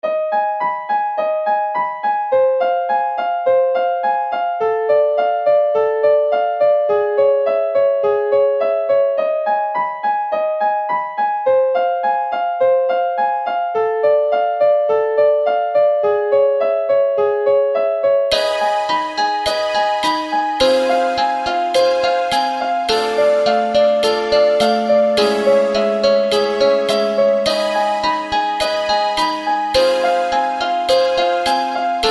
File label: piano guitar